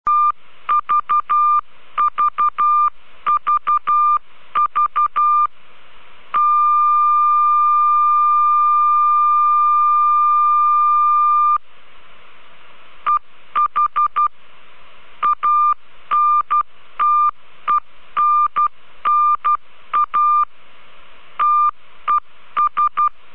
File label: beacon, antenna; eh-antenna